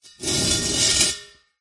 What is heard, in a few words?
industrial gas canister metal scrape on gritty concrete surface; zapsplat